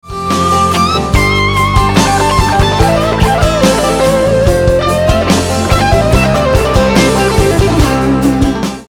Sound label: legato